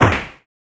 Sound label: 8 Bit Ricochet